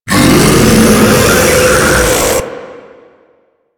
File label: Taunt Scream; Enemies; Abilities; Warframe; Proto Infested Ancient